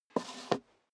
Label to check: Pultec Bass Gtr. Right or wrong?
Wrong